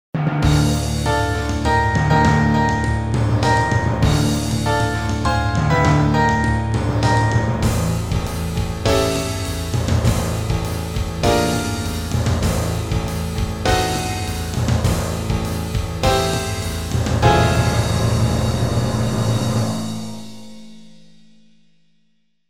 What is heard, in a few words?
overture